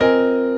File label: CHORD L, Drum & Bass 140 BPM